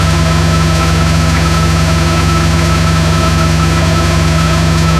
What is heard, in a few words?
v8 engine; magnum; vehicle